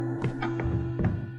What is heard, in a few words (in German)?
schritte; We Were Here